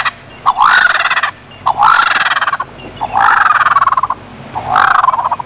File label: Birds; Soundsation; Animals